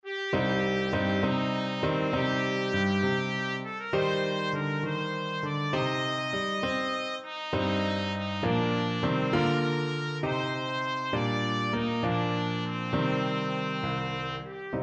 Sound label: upon a summers day trumpet